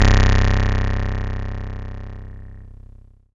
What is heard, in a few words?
C1, Synth Line; NiceBeats